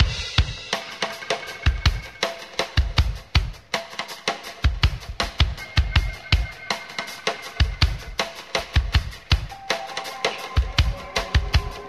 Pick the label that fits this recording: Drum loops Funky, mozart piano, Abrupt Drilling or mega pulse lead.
Drum loops Funky